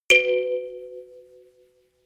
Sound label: notification kalimba rattle fourth